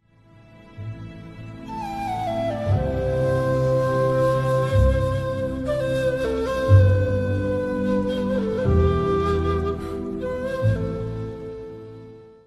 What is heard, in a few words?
sweet flute theme music; kurulus osman